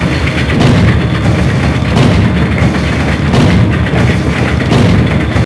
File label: ambience steelmill; cold steel